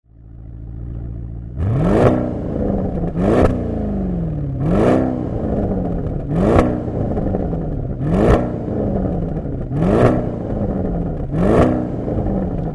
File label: Cayenne Turbo Onboard; TECHART; Magnum T2